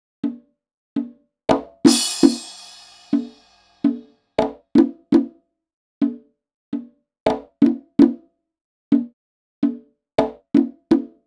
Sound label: Percussion, cinematic funk